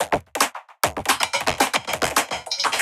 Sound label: Loop, 70 bpm, Circuitbend